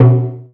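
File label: Malaysian PERCussion B1; USB Soundscan vol.60; Asian Traditions; AKAI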